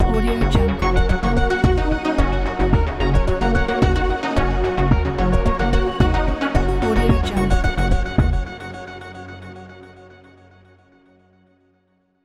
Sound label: short logo